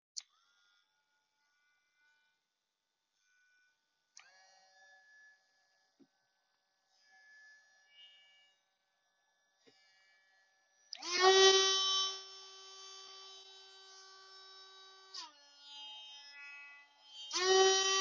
Dremel

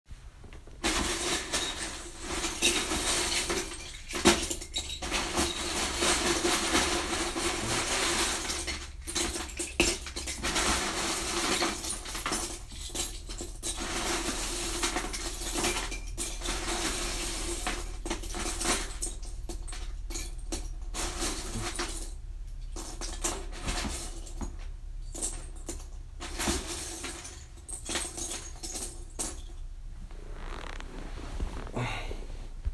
Legos

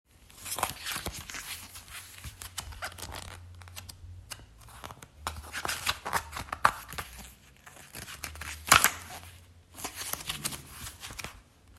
Book Page Turn

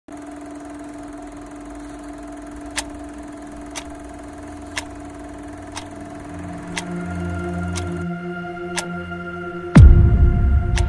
Projector Sound Effect

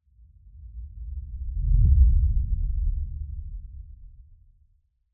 Trailer Sub, Whoosh; Epidemic Sound

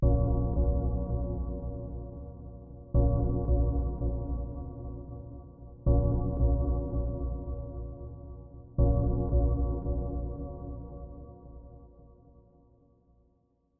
thoughtful pads progression 69bpm A# minor; silent-hill

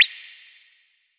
MENU Pick

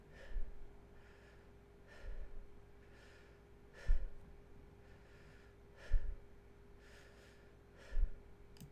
Breathing

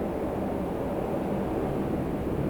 Wind audio

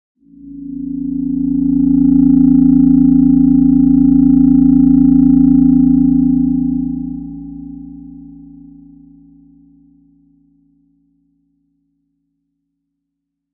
radar scanner deepsea

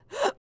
vocal gasp "uh", C major

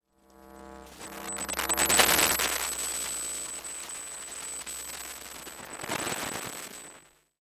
Soft Glitchy Hiss

